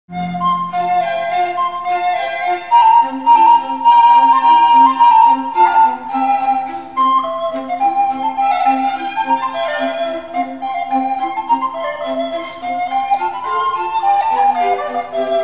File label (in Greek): flogera